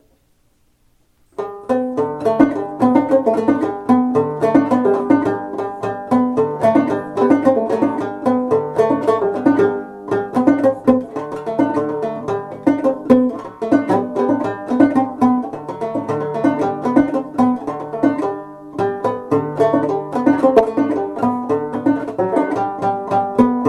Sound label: circus jig